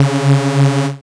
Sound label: BLAST Db2, dubstep; Jack Sparrow; Deep Dubstep Progressions